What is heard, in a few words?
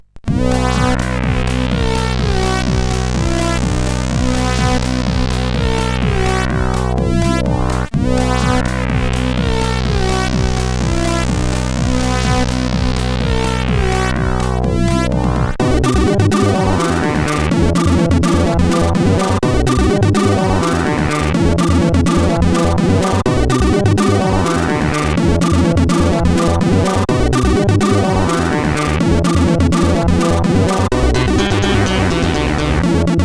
intro sid CSG8580R5; Design 1